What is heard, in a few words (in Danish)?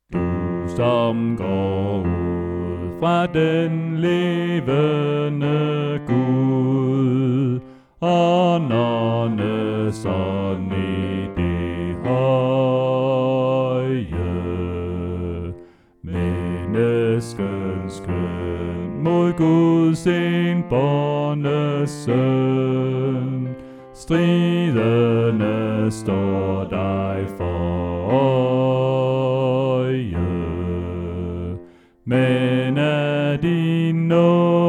Bas